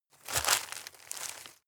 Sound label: step leaves heavy boots